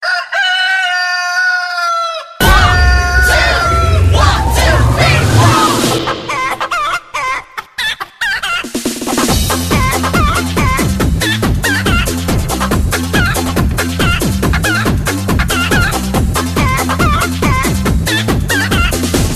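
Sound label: henhouse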